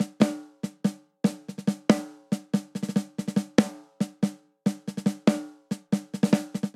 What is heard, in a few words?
Syncopated Folk Snare Only 142 bpm; MIDI Clips; Ableton; Factory Packs; Drum Booth